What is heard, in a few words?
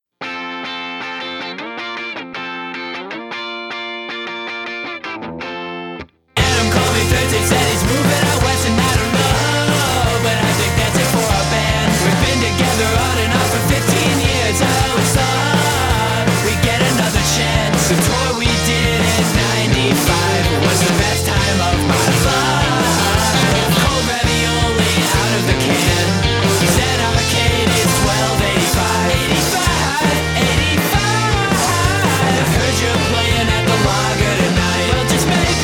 breaks